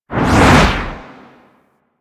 Dodge Roll Right Low; Movement; Warframe; Archwing

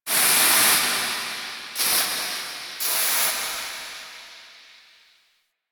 Steam Blast; Ambience; Warframe; The New War; Quests; Zariman

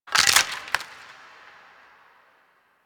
Bone Break; Ambience; Golden Maw; Warframe; The War Within

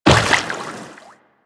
Step Water, Footsteps, Walk; Warframe; Tenno

